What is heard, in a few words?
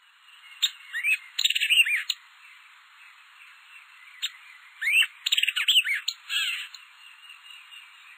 white eyed vireo ohio